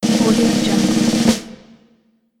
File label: military snare drum roll